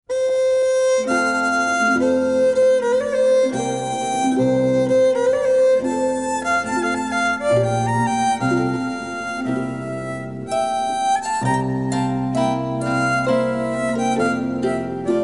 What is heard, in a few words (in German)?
Volksmusik